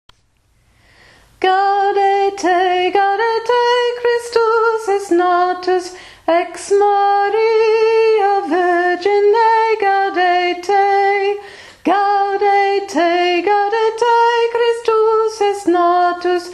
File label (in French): voix alto; gaudete